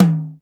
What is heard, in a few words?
T, 60S KITS; 90 sSampleCDs; Crypton - DrumBase5000